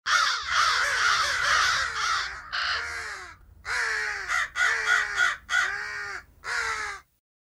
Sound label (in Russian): vorony derutsja ili bespokojatsja